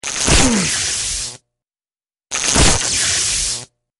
Stun Gun Alert

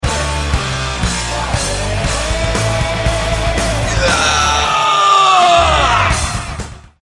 Heavy Metal Music Wake Up Alarm With Vocals

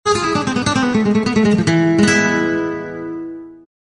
spanish guitar lively accent

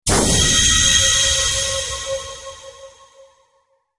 Hyperdrive Alert

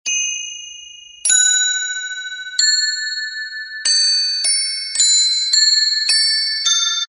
perc bell tree rain shower

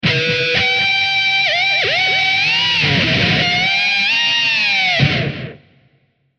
Alert

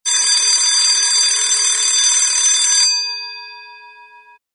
alarm bell

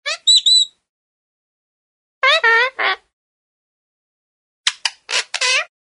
birds parrot chatter

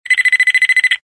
alarm short electronic chirp

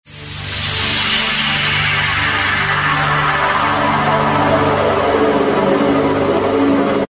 set adrift

